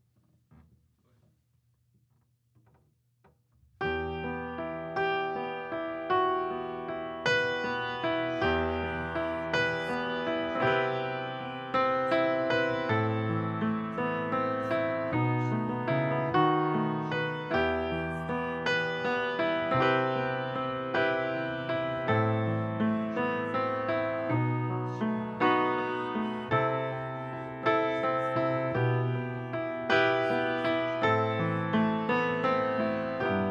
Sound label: piano